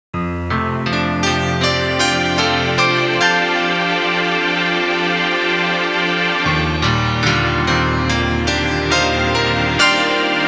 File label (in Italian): musica newage